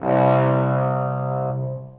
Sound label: Eb Tuba Solo Mute Legato; Ableton; Orchestral Brass